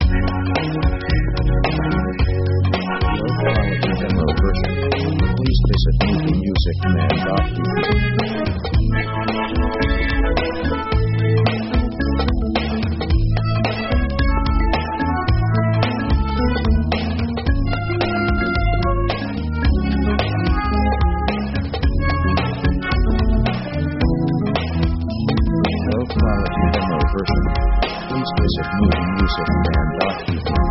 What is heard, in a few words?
Jazz; synth themes